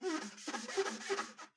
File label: clean